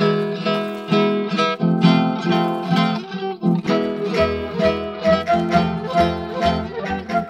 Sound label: guitar cliche; Loops; Phonky